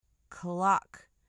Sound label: clock